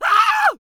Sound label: female scream